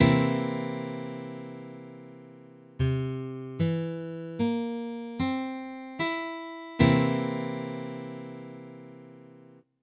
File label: C7, Guitar Chords